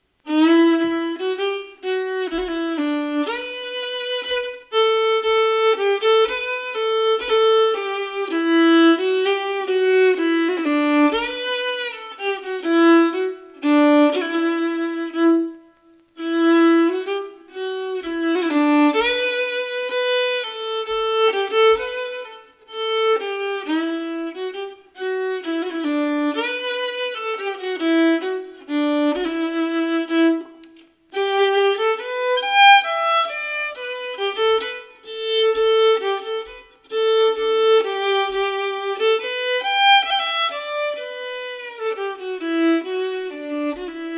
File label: Peat Fire Flame slow